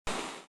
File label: torch fire; weapons; grubs